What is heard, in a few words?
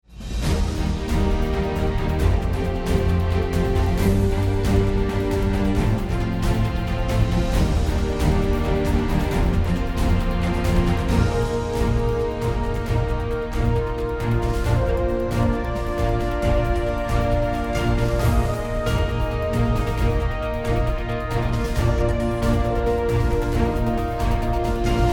Bed; Sitting Duck Music; Good Morning Britain